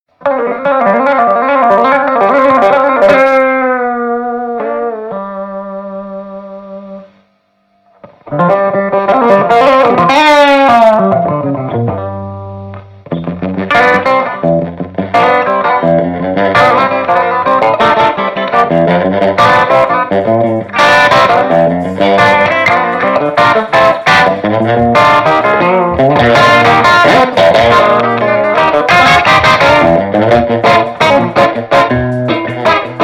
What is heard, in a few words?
guitar